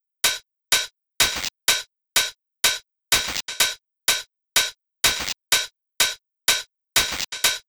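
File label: Club Beat E, drum samples dance electro; drum сэмплы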